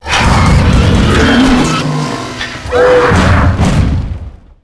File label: pain; quake2; boss3